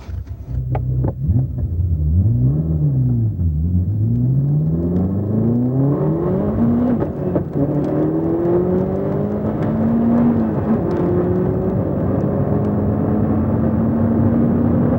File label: car